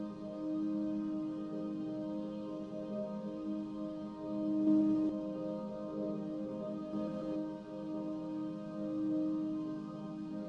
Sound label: Tension Synth Augment